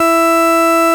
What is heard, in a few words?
FARFISA E2; Sonic Reality; Vintage Timetraveler